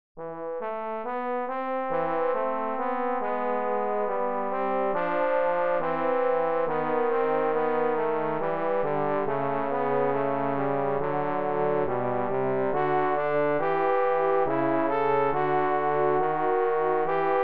duo pour trombones avec accompagnement

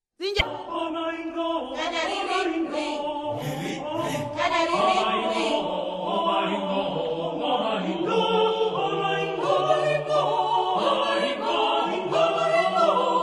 fugue

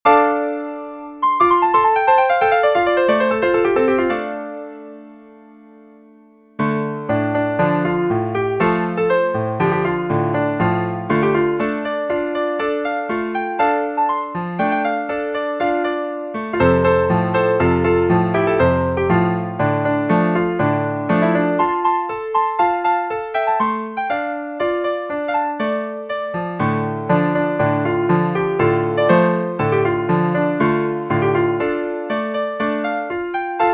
danse traditionnelle

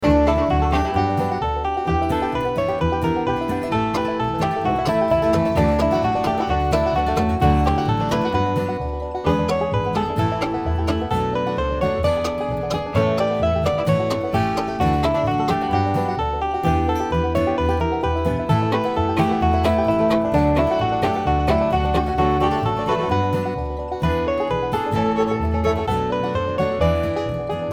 bluegrass

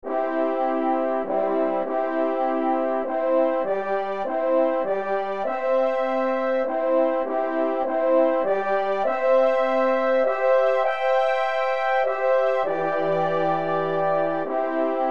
hymne; suisse romande

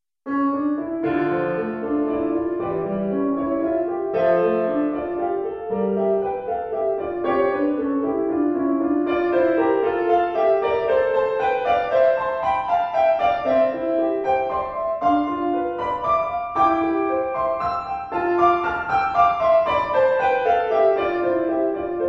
etude pour piano

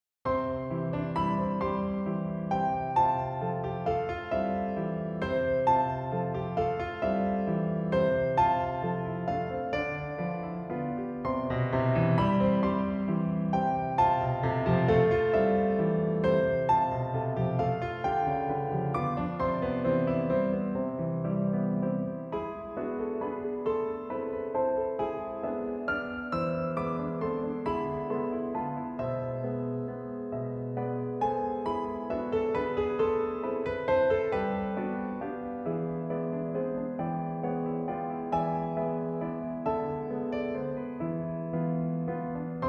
petite rengaine pour deux pianos

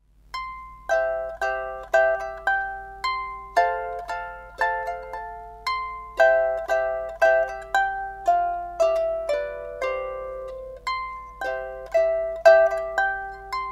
menuet majeur

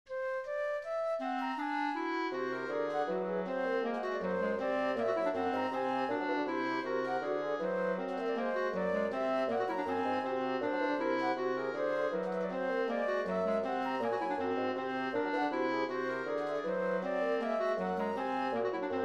symphonie; 3eme mvt approche

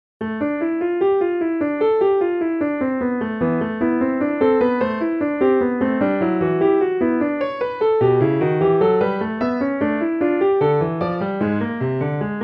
fugue; prelude et fugue en re

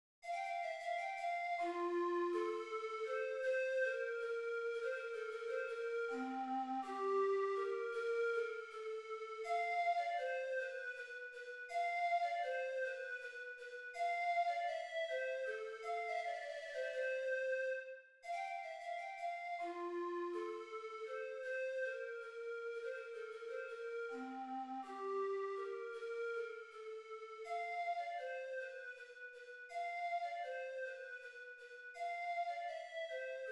menuet quintette cordes; boccherini luigi

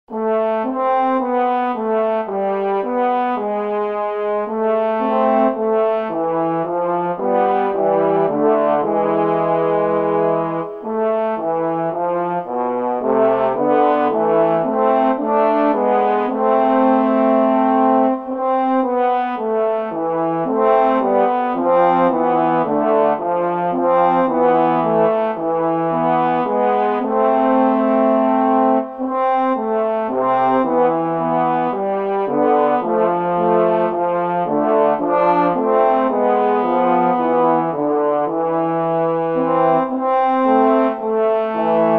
petit duo en la pour trombones

